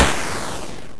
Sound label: explosion